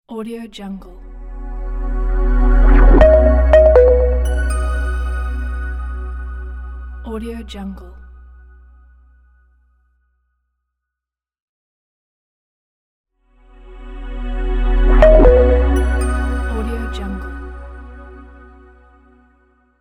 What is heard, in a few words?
intro logo